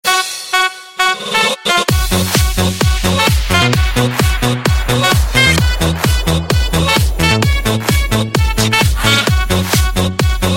tic tac tic

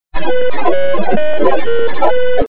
Phone; Flint

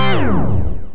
Chord; Xyphos

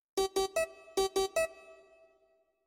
SMS Harpsichord

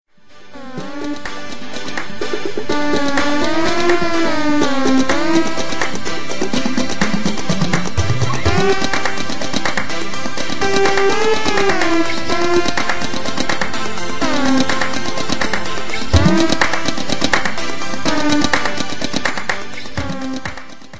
Barracks Tune

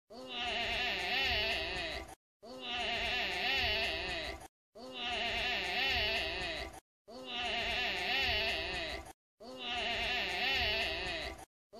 Goat

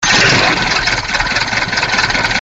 Tank Engine Start

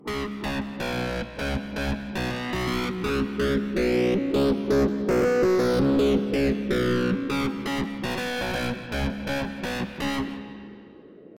Riff

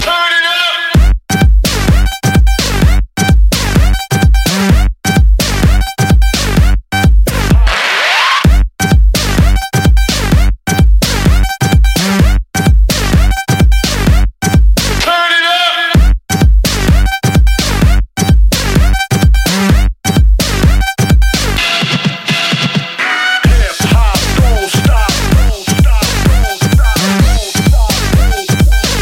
Hip Hop

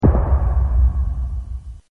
Thump; Lost

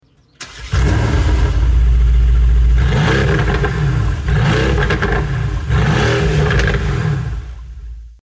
AMG GT-R

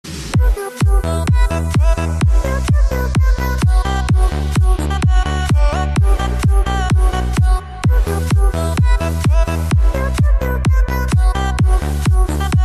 Bass Bounce

